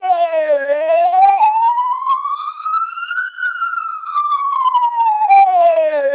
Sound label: siren twelp